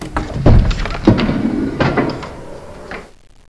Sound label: door move